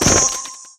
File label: NPC Killed